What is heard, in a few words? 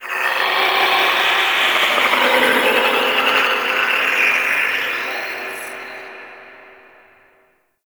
Golden Maw Ambient Whispers; Warframe; The War Within